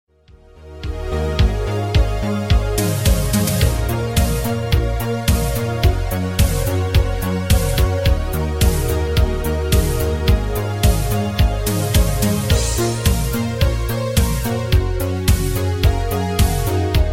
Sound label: music disco